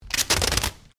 Sticky Tape Rip Pull; common tape; Offices

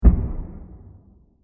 Diffuse Explosion; Blasts; Impacts; Crafted Signals

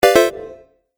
Game App Surprise; video game

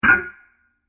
Metal Clunk Sound, impact; Miscellaneous Impact Sound Effects

